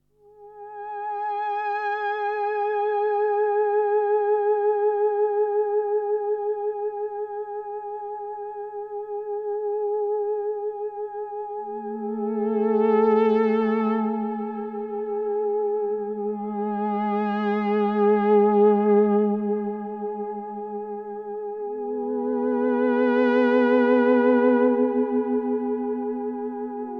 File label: pro12 soft strings